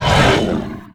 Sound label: Pickup Magic; SFX Kit; Pickups